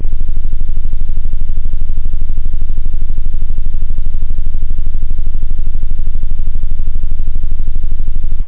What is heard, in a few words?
sub base, bass, synth; da capo vol1; instruments